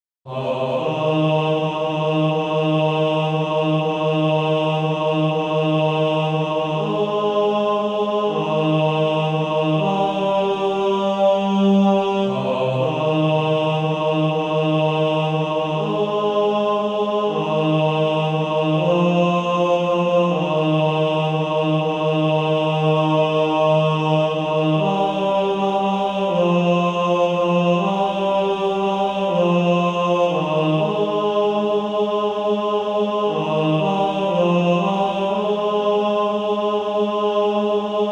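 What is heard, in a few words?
Male Choir, bass 2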